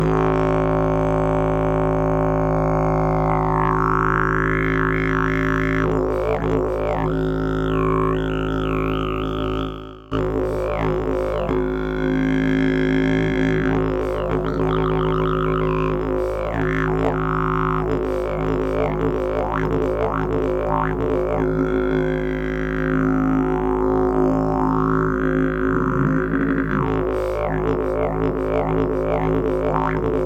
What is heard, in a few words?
didgeridoo; jilm koralovec